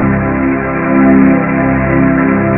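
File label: CHOR major; jazz jackrabbit 1; M8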